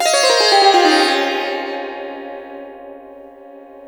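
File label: sitar glissando; Spectrasonics; Heart of Asia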